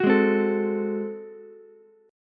G sharp 7th Flat 9th G sharp down Ukulele C6, chord; G#7b9; strummed; JGuitar